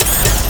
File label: retch run; Quake 4 Alpha; id software; strogg